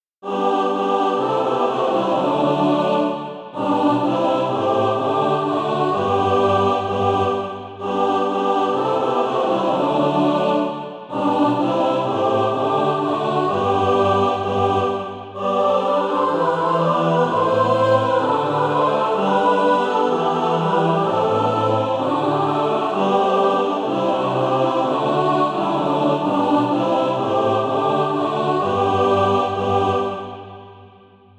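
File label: mixed, SATB Practise Music; Ding Dong Merrily on High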